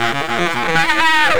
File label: Baritone Effect, sax; Effected; Sonic Foundry (Sony Creative Software); Jazz Solos and Sections